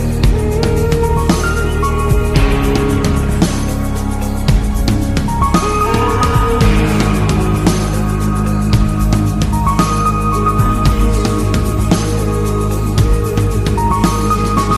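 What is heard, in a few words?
guitar english music ringtone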